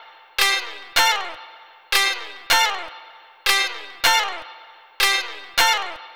Gt Chikz C; Zero-G Kocktail Kollection; Construction Kitz (Lounge)

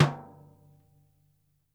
DEAD HIGH, TOMS; SW TOMS

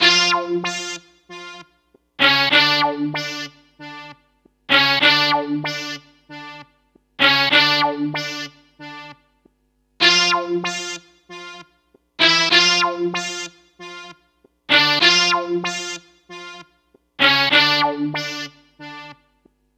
MPC Synth Stab; Sonic Foundry (Sony Creative Software); Club Joints and Street Anthems; Toxic Rain

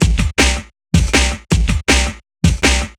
Stomp Beat, Drum N Bass; Sonic Foundry (Sony Creative Software); Knutrix Drum & Bass

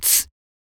Beatbox; neuro; Sinistarr

